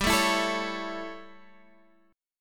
A Major G, Chord

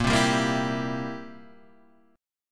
C 7th Bb

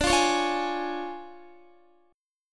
D Minor Major 7th Flat 5th, D, Guitar; DmM7b5; strummed

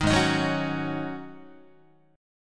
Ab 7th Suspended 4th Ab down Guitar

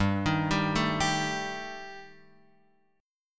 G Suspended 2nd Sharp 5th G, Guitar Open F; arpeggiated; x,2,3,2,0,2

